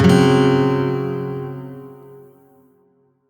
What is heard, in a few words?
Shawzin Hirajoshi Chord B; Warframe; Lotus Shawzin